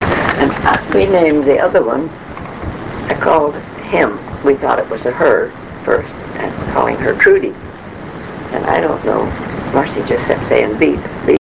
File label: beeper